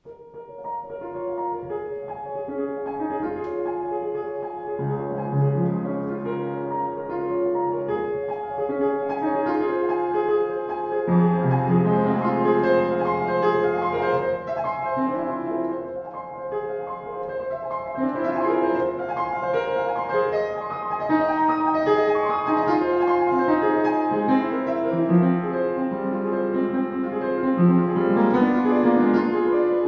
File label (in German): Sauter, klavier; 118 sauter r2 rr schwarz